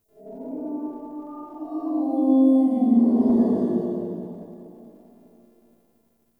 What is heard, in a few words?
Exterior Distant Calls Angry, Ambience